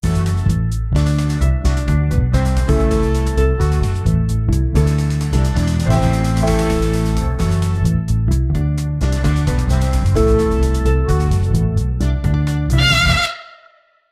funk music intro with bib, intro

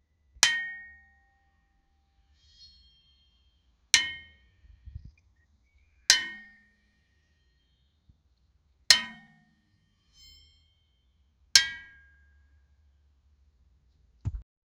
the sound of impact on, clang

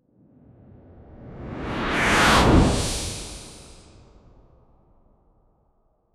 bright mystical whoosh

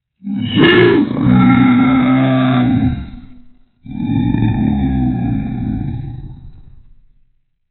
king roaring with anger

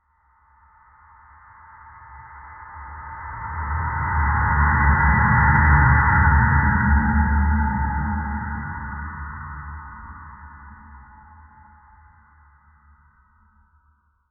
grain woosh slow gelatin, creatures

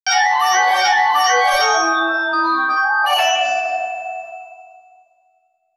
chinese video game win so

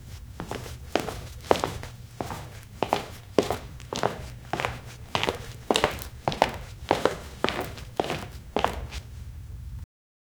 just male leather dress s, shoes

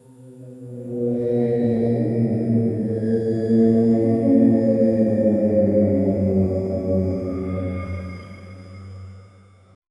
dark medieval horror ambi; church

